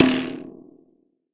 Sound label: Processed Ethn Perc, ETHNIC and WORLD PERCUSSION